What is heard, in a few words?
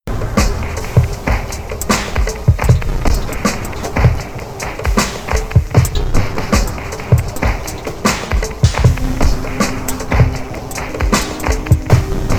teletype